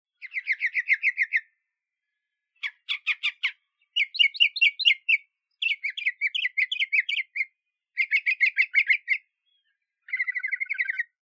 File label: Mockingbird Northern